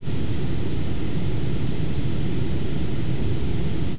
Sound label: fire